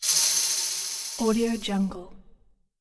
hiss and shake; audiojungle

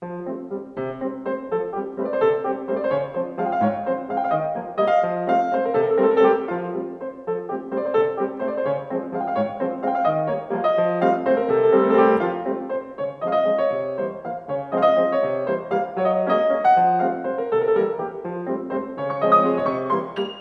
waltz

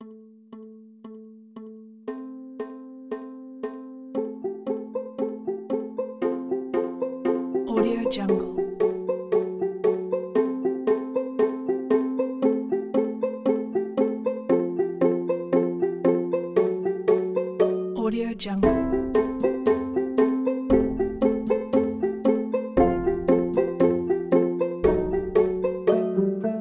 ticking clocks, chiptunes

